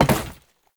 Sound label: Step Glass, Foley; Warframe; Oraxia